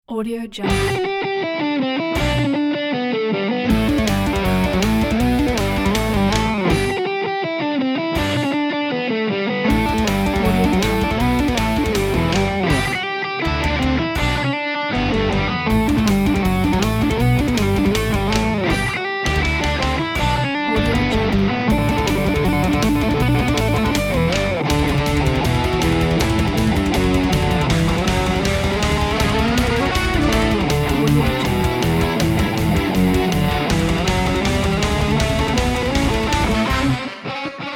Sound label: metal russian dance football rock